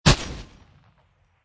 Explosion Mortar Fire, Fire missile from mortar, projectile being catapulted from shoulder he; Fire missile from mortar, projectile being catapulted from shoulder held mortar, rocket launcher or grenade launcher.; Cannon Sound Effects; Lynne Music